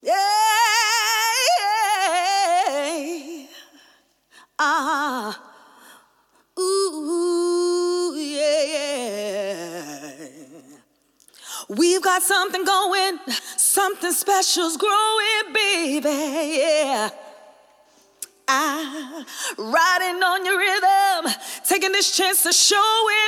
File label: Accapella